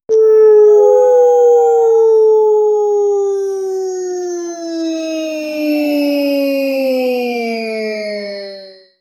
game sound of death, glitch

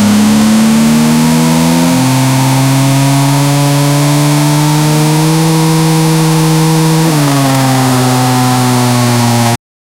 high rpm engine sound for; redline

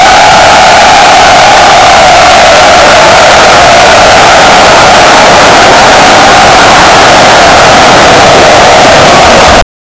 fans at a soccer, stadium

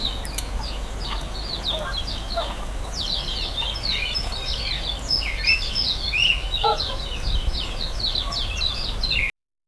winter rural area people, light breeze

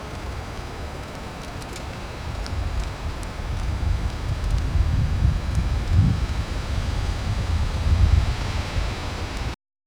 light wind in the park

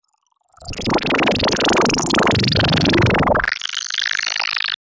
alien being born, birth

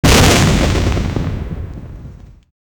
fireworks sound game

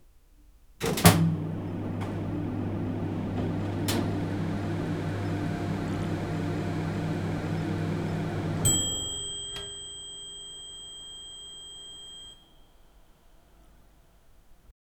the professional kitchen, appliances

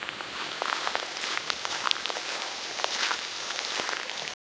8 bit walking on grass; chiptune; 8 bit walking on grass so

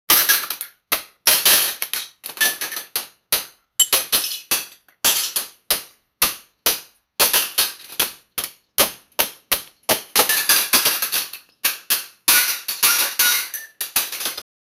ice

